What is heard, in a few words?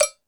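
Jungle Percussion, Drum Machines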